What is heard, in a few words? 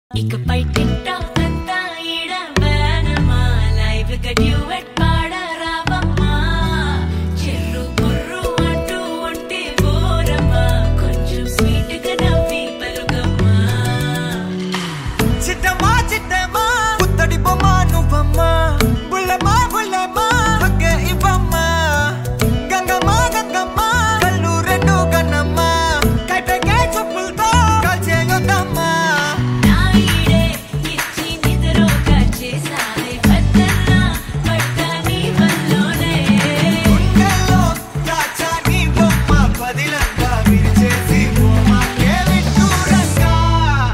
Telugu Ringtone; Varun Doctor